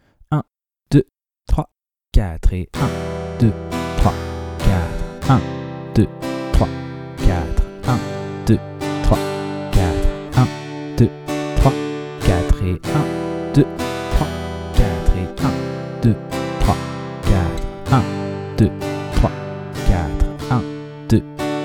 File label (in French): rapide travailler la nouvelle grille avec la deuxième rythmique; De 0 à vos premières suites d'accords